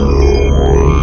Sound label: hacking beam; Earth and Beyond; EA Games